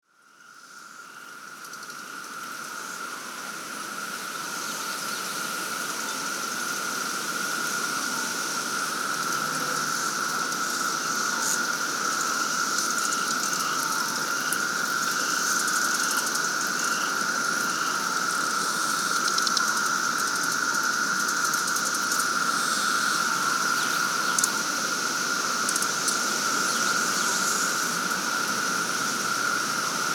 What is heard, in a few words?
Lake Springfield Cicadas; Cicada Bloom